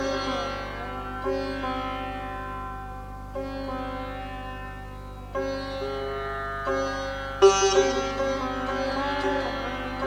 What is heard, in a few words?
Raag Marva - Sitar; raag marva on sitar